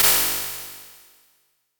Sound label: imp, Impulse Response